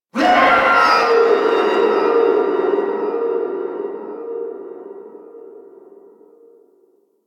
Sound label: Stadium Infested Medium